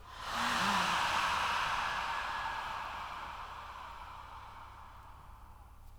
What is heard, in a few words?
Death Sound; Hitman Blood Money